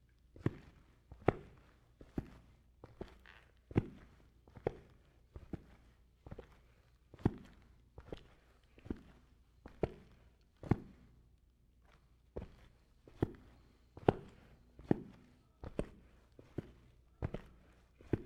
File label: Concrete Boots Walking; Todd Blankenship